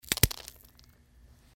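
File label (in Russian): перелом руки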